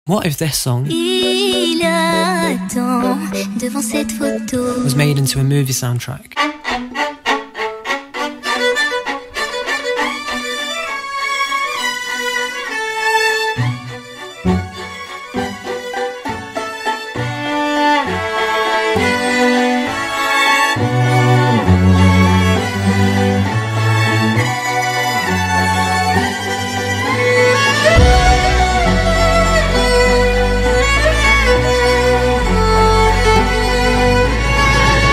dramatic violin solo

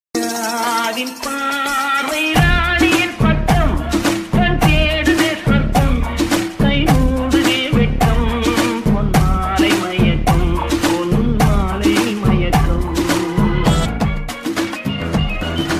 male voice music remix dj; mgr

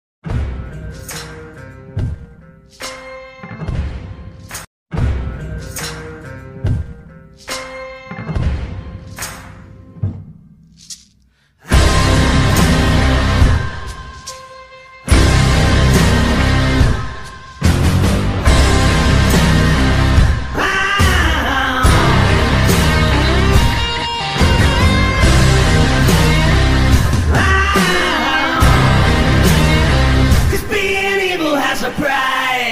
ringtone background music bgm ringtone

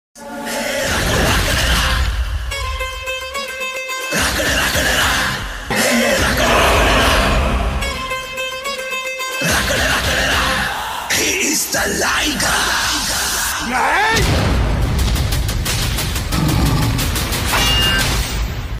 movie bgm ringtone; liger; vijay deverakonda

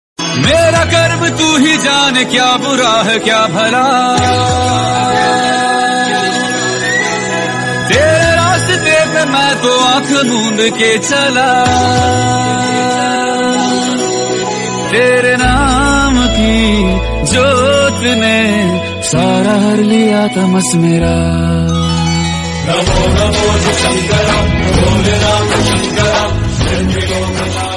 ringtone, lord shiva ringtone; mahakal

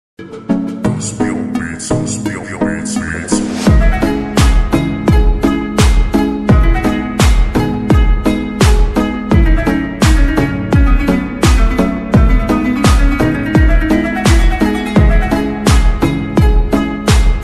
slowed reverb ringtone lofi; azeri kavkaz

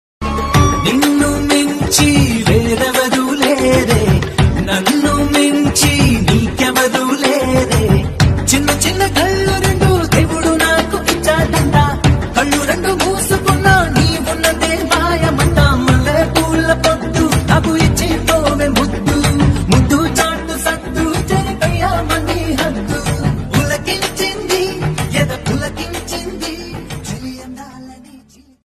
telugu love songs

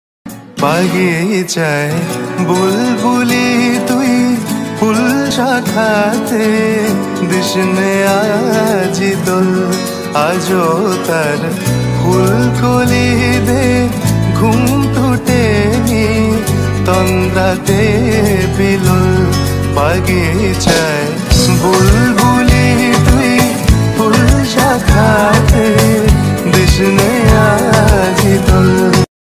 bangla ringtone call ringtone

